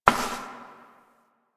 Step Perforated Metal; Warframe; Koumei

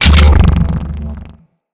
Dog Tag Pickup; Syndicates; Dog Tags

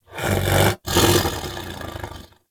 Pet Snore; Enemies; Warframe; Catbrow; PetShip

